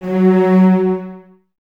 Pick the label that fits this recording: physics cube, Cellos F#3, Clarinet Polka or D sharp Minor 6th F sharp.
Cellos F#3